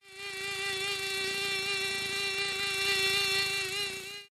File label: dragon Fly; main Game; left; scruffy duck